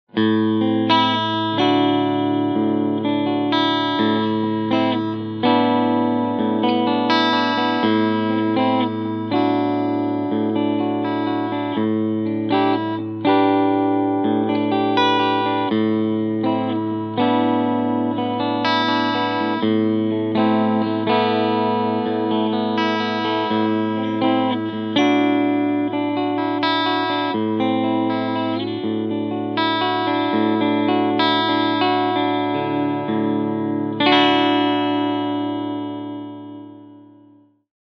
Epiphone Les Paul Classic Worn E Gitarre Overdrive Cleansound beide PUs Singlecoil

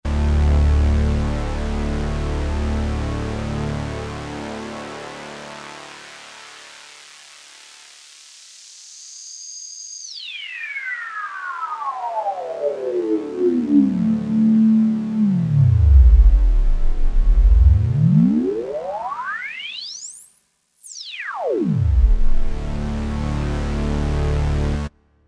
Highpassfilter KingKorg

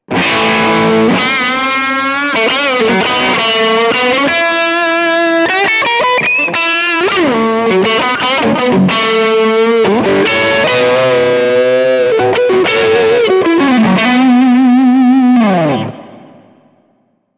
Fuzz 9 Uhr Hals Singlecoil; Baltic Blue

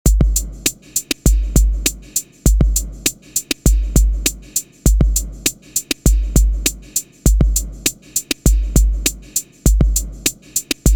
StudioLive AR16C Testaufnahme Buffer 128

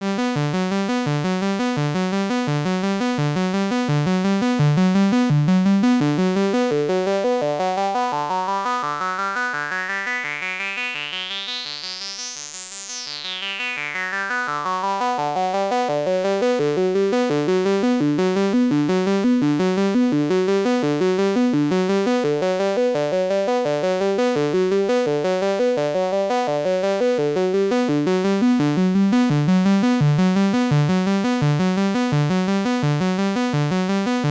Behringer K2 Sequenz HP Filter

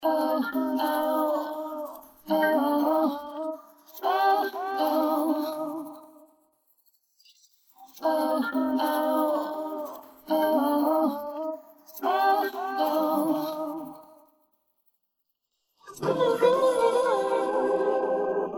iZotope rx8 song nur vox

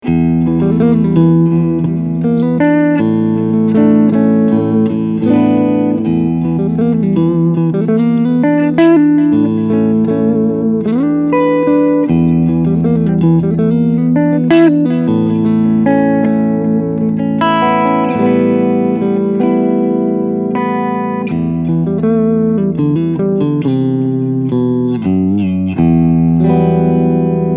Clean Front und Mittel PU

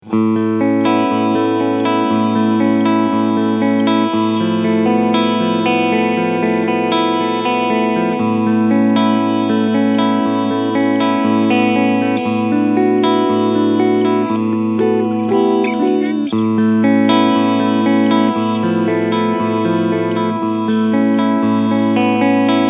Fusion II HH beide PUs Clean SC Modus; EB Ocean Turquoise

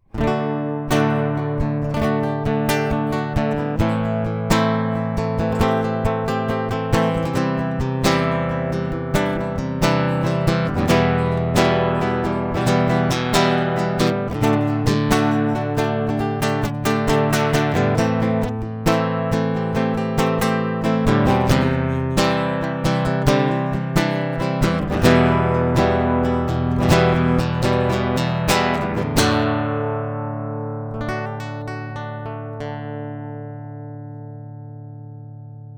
Ibanez Konzertgitarre FRH10N Strumming

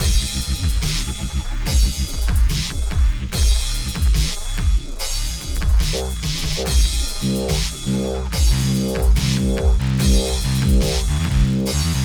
Elastik dupstep

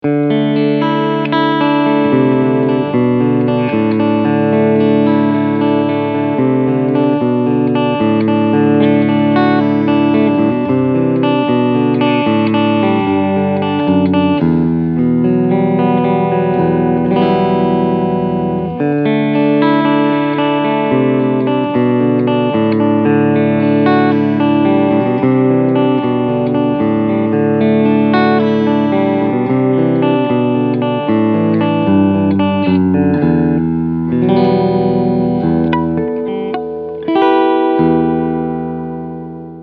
Beide PUs Cleansound

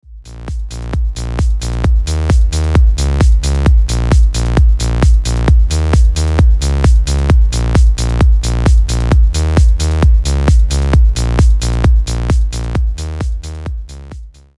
Saegezahn Context

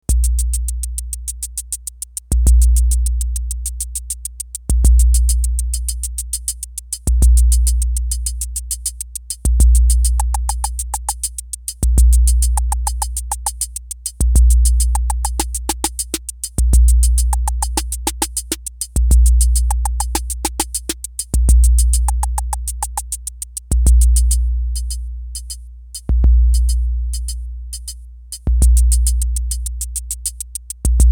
TT 78 Beat Bot anders

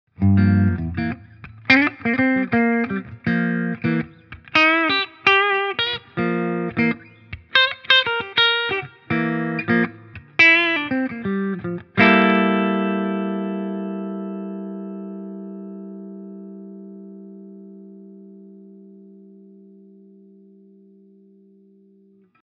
Gibson Les Paul Tribute Mitte clean

